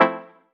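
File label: Fmaj7 chord House Hitta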